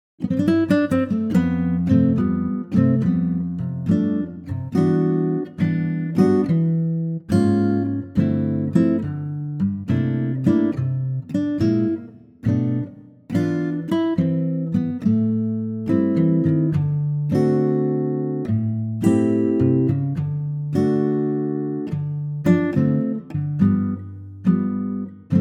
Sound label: C Ac Guitar; key - C - vocal range - A to A; Acoustic Guitar arrangement